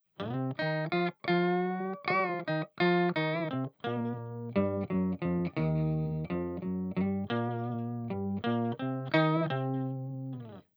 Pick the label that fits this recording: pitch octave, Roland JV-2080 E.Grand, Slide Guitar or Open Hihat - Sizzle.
pitch octave